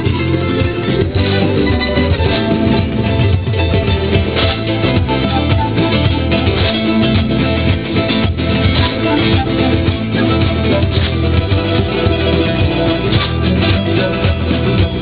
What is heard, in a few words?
peruvian guitar players; Rome; People; Europe; Italy